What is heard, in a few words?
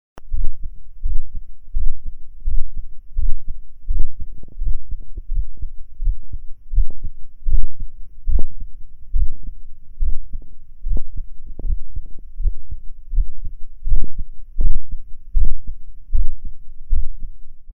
beat